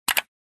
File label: Click Sound